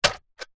science fiction robot android single footstep wood